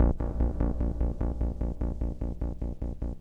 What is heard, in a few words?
bass del; recreational research foundation